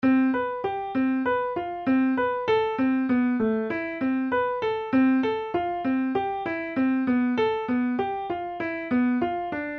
overworld, looping background music. It has a calm and minimal tone